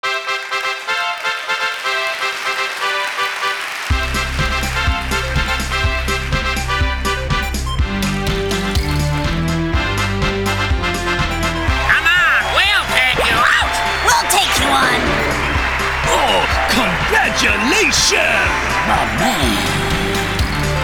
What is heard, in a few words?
Win; Penguin Bros.; Motor Toon Grand Prix 2